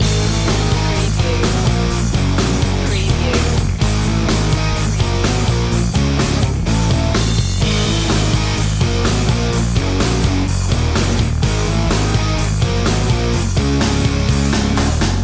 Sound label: angry confrontation loop